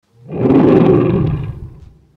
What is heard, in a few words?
roar